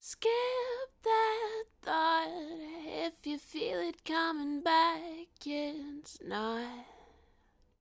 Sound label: Vocals Lo Fi